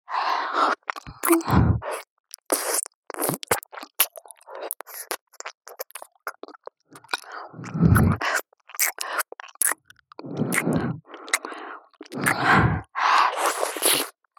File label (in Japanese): 友達みたいな彼女の右耳舐め (ループ); SEあり